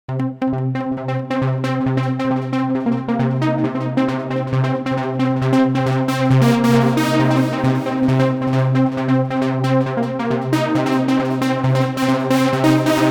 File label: Trance Nation XL, Korg